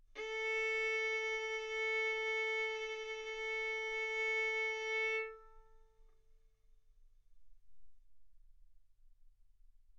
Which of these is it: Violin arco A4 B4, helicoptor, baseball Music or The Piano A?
Violin arco A4 B4